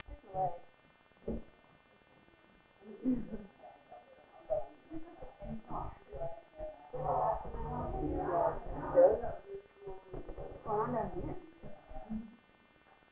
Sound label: noise